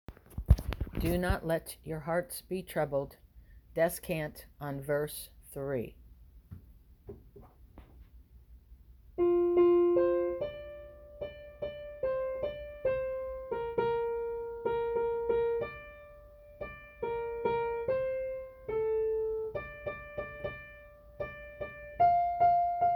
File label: descant